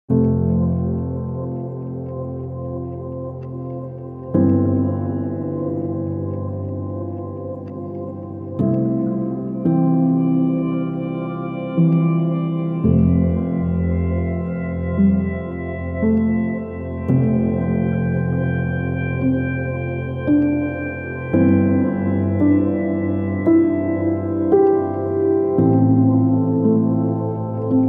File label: Emotional Atmosphere; natur